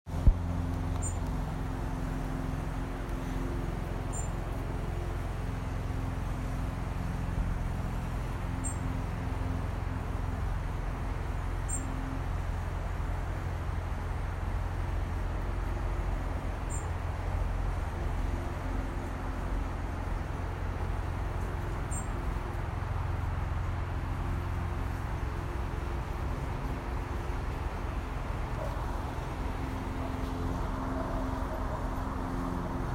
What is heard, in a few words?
Birds